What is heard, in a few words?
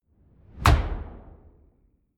sci-fi shot tonal big; schusswaffen